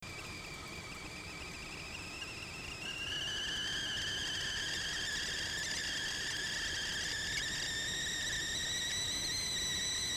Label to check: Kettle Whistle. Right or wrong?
Right